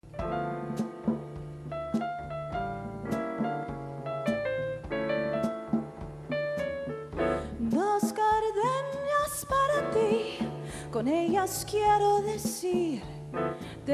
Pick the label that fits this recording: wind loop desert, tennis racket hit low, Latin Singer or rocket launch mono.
Latin Singer